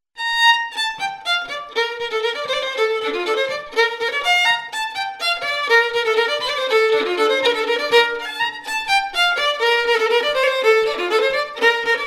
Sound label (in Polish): polka